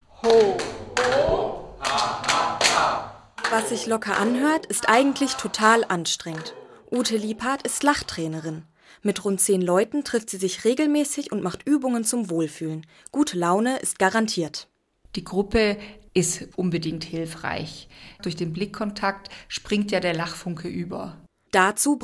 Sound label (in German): Lachen